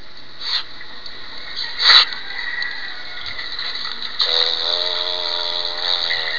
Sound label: otter